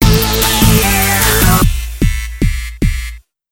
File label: Complete!; the powerpuff girls mobile games ost collection; android ios gamerip; Glitch Fixers